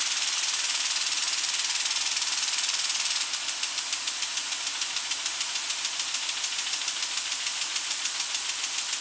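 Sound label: sprinkler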